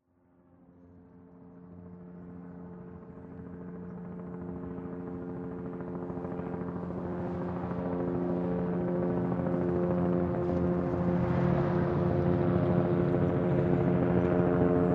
Helicopter

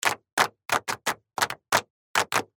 crowd clap

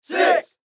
Crowd

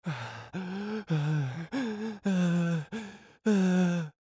paniced breathing

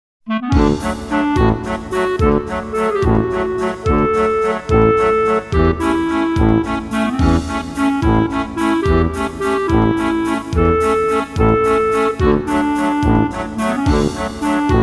Bavarian Waltz Trad. German; Soundzabound Volume 2

